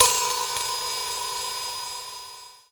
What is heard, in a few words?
long crash sound B key, Royality free crash cymbal tuned to the B note.; cymbal drum samples